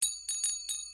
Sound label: triangle percussion; короткие, инструментальные; на уведомление; на смс